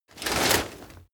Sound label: plate armor movement rustle